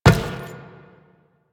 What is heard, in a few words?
Step Metal, footsteps; Warframe; Teshin